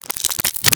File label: skeleton resurrect; edgar; enemy